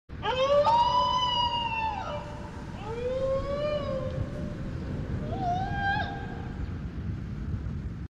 Dingo; دینگو

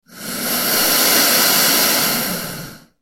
alligator sound

